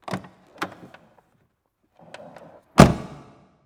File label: Car Door With Reverb